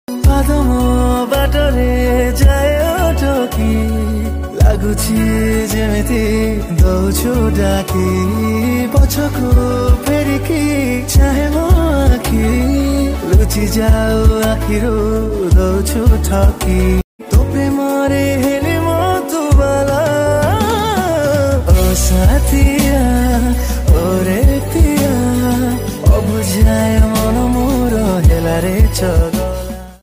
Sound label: Odia Romantic